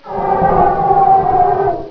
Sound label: alien hollow, ambience